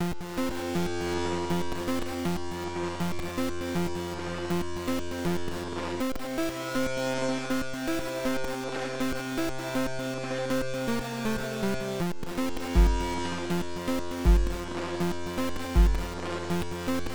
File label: Battle Music; Super-Robo-Squad